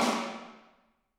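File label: snare drum, Drums; Bob Clearmountain Drums I; SD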